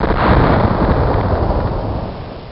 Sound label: thunder